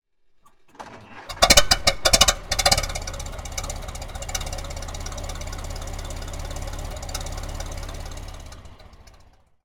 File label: transport car model t ford start up; martinimeniscus